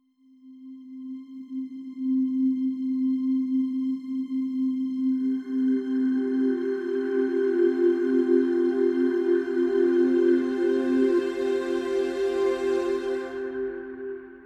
pads only

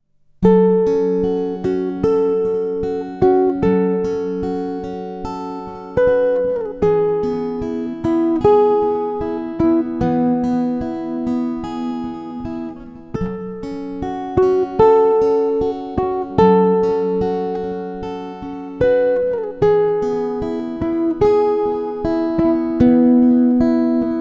latin american chill out acoustic guitar

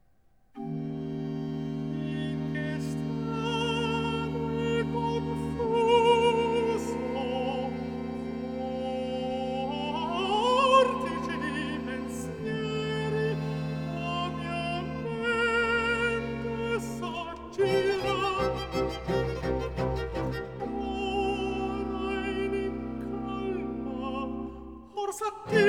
cantata for alto solo, recitativo

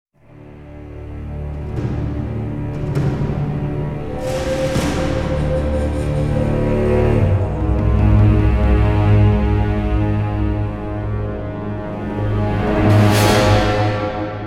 magical trailers transition; sound adventures

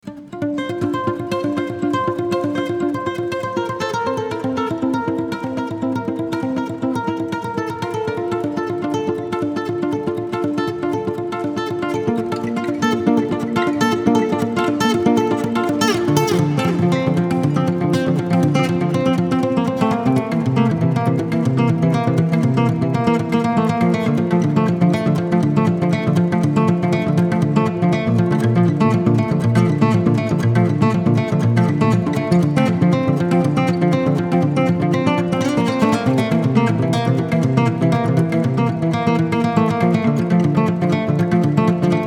acoustic

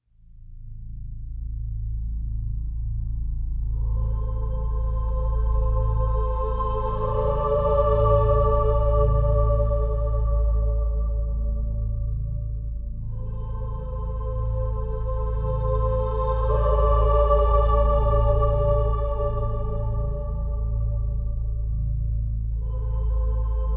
no strings

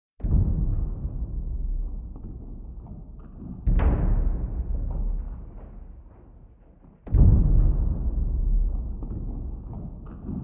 drums

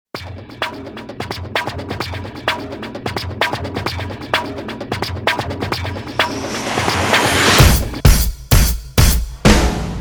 drums fx

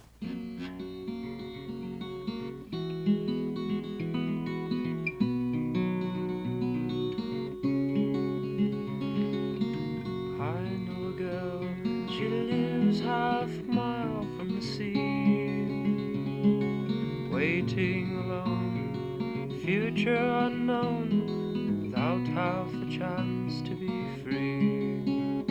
acoustic

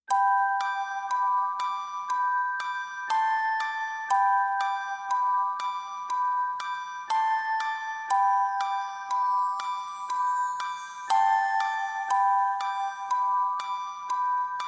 no choir

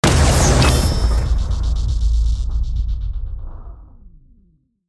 airwave hit big; Ninja Tracks

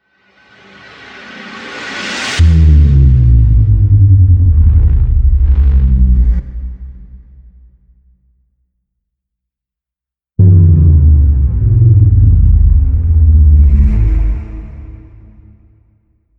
rumble jungle

